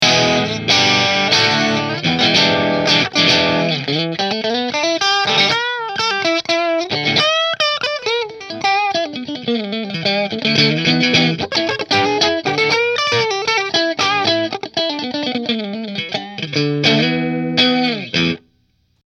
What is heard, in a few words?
Amber Burst Position 3 Marshall